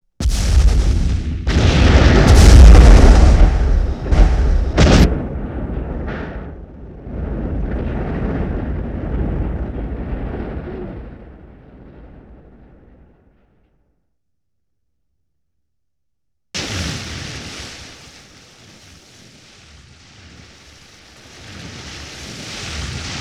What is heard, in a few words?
Explosion, 5.1 Surround